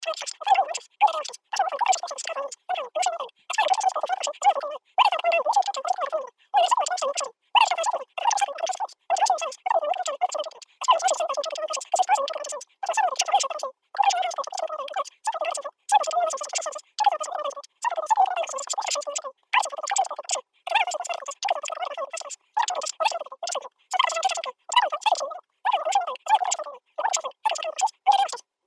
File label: Phone Call